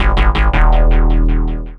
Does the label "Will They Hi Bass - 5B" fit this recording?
Yes